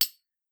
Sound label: metal small; Phasmophobia